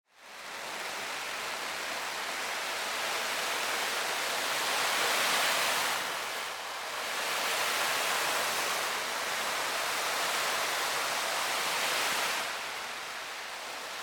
PLACAS DE RADIOGRAFICA COM SAGÚ

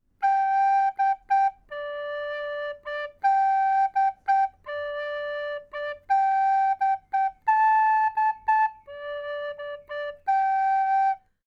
FLAUTA DOCE SOPRANO

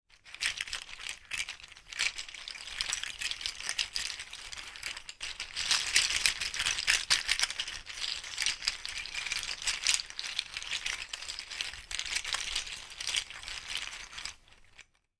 CABIDE DE TAMPINHAS

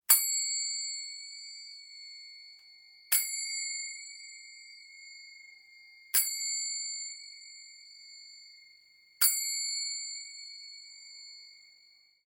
CÍMBALOS

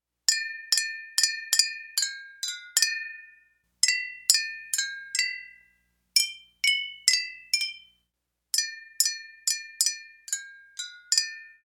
VIDROFONE

